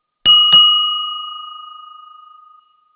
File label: Bell Codes, block Signalling